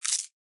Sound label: crisp nom; snakeinabox